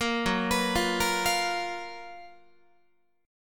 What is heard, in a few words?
Gb Major 11th Guitar; GbM11 arpeggiated